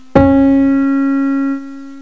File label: PIANO NO4